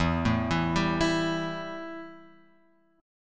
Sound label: E Minor Double Flat 5th E; Embb5; {0 0 2 0 X 0} arpeggiated